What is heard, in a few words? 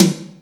Toms